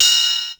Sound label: Open Hat, Ensoniq ASR-X Pro drum machine; 300 Drum Machines